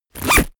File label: zipper open close